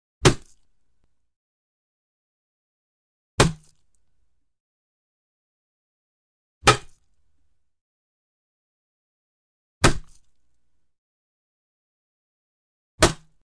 Skull Hits With Billy Club Heavy Wood Club Into Skul; Hollywood Edge Foley Sound Library